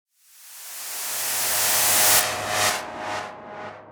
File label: Swept Noise